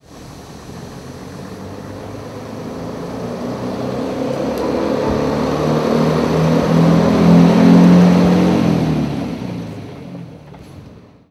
gwr railcar; simutrans; Pak128.Britain-Ex

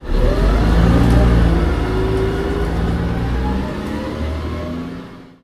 leyland olympian; Simutrans; Pak128.Britain-Ex

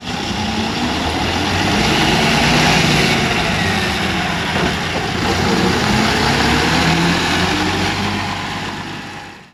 Bristol L6B; simutrans; Pak128.Britain-Ex

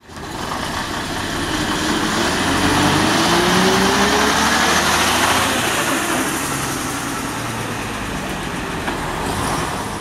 leyland titan pd1; simutrans; Pak128.Britain-Ex

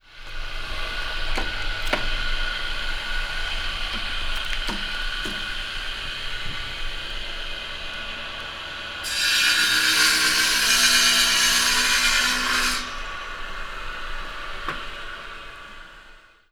carpenter; robinhood76; simutrans; Pak128.Britain-Ex

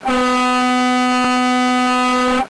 ship horn; Simutrans; Pak128.Britain-Ex

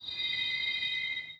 brakes; Simutrans; Pak128.Britain-Ex

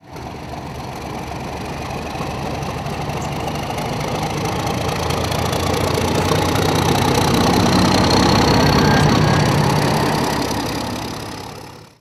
class 31; simutrans; Pak128.Britain-Ex

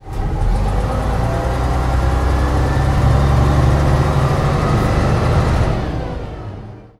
mcw metrobus; Simutrans; Pak128.Britain-Ex

